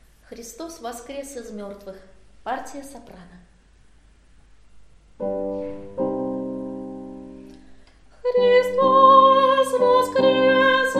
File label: soprano; Hristos Voskres